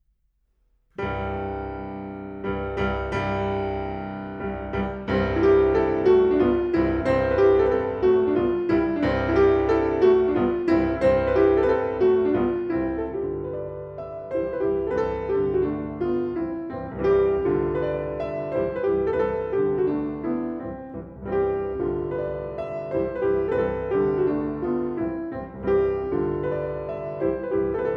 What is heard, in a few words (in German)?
Mazurka C Dur; Frederic Chopin Edition Vol. 10 Mazurkas; Profil/Edition Guenter Haenssler 2017